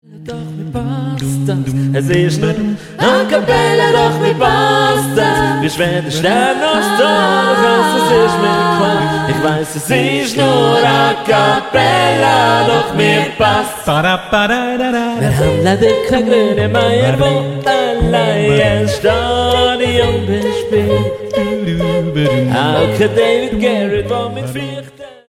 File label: acapella